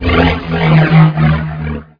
tie fighter spin; lego star wars

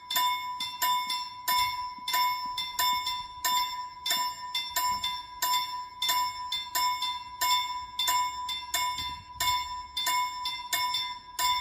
bells railroad crossing

